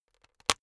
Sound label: plastic slowly pressured